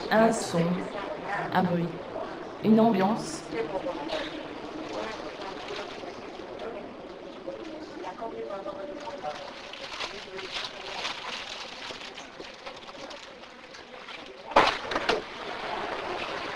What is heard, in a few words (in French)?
Un son un bruit une ambiance